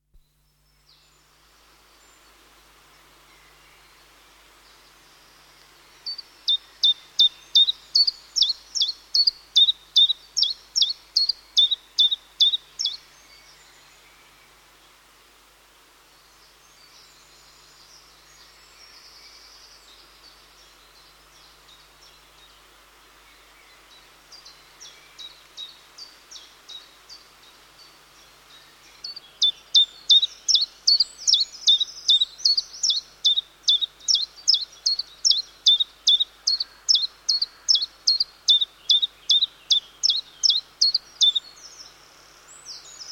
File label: Chiffchaff, with wren, other chiffchaffs and song thrush in distance; Bird Sound Effects; BBC